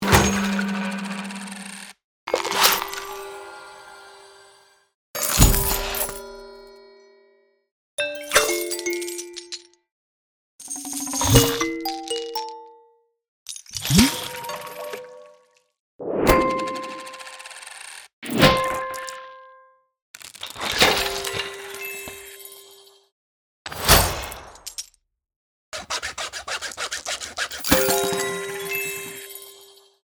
Earnings and Rewards, Organic UI & Building Game SFX; APPlicable Sounds